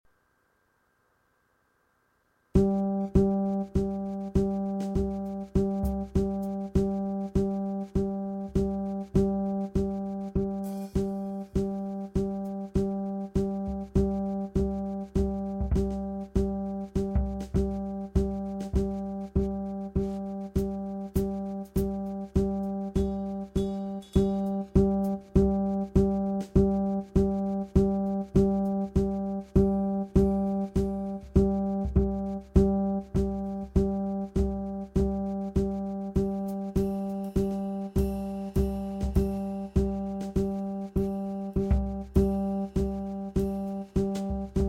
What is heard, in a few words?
Backing, jazz